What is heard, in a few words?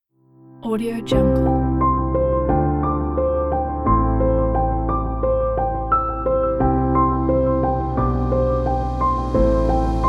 relax corporate